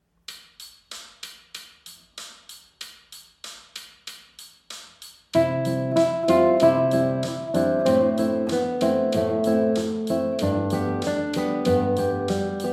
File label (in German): Keltischer Tanz